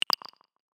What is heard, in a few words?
button click